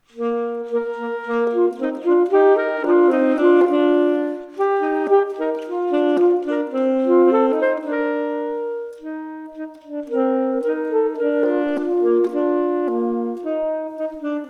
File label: Duet